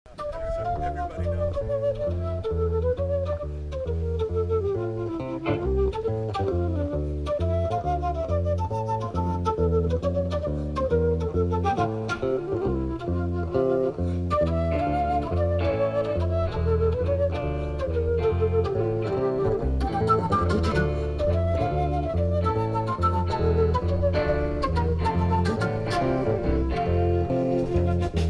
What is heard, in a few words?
Bourree